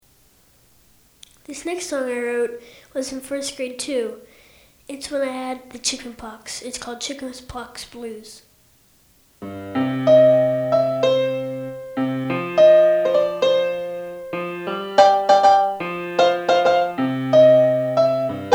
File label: Chicken Pox Blues